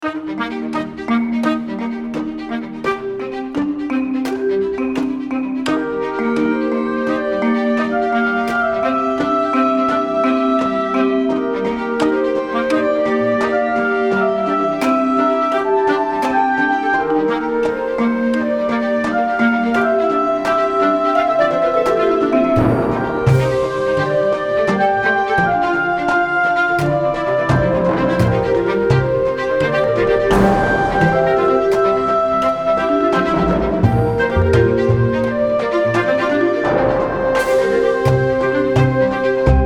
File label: Theme Orchestral; Intellectual